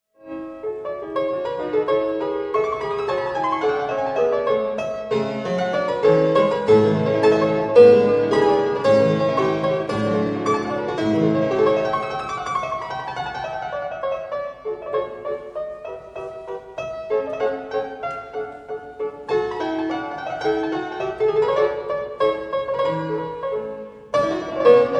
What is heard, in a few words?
piano sonata in D K 576; wolfgang amadeus mozart